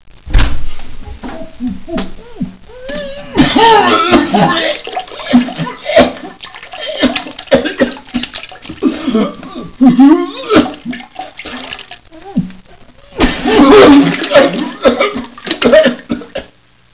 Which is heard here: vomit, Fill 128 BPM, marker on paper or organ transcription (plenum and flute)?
vomit